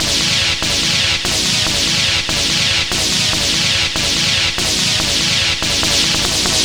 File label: Stab 144 F#; 90 sSampleCDs; Club Techno